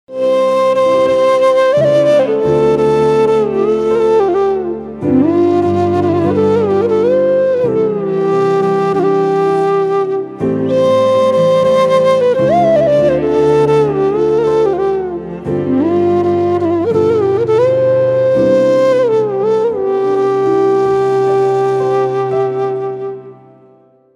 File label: Flute Ringtone